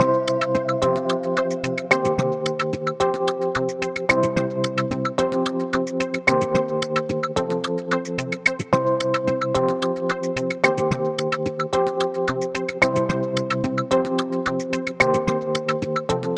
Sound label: Music Gameplay; Smart As...; PS Vita; Music Arithmetic - Gameplay2